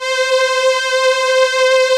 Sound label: vocoders; Sound & Vision - Gigapack I CD 2